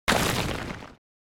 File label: Maggot Enter Ground; The Binding of Isaac; SFX Monsters